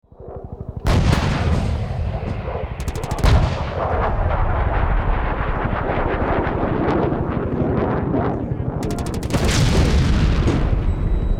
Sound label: Military Battle Modern